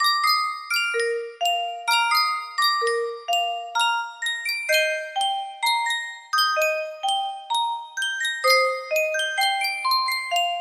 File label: music box